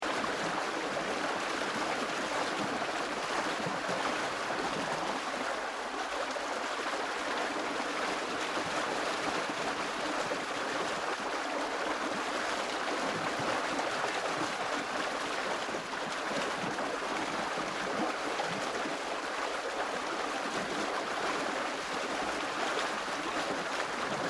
Nature Countryside Waterways Weir Canal Water Behind Sluice Gate Strong Fast Flowing; Water Sound Effects